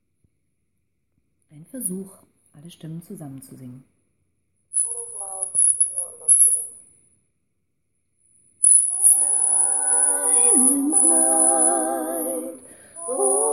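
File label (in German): Silent night alle stimmen, gospel choir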